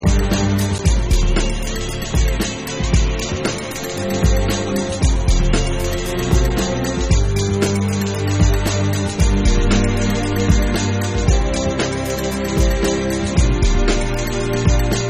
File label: Instrumental